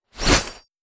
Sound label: melee stand; custom weapon; k31; octane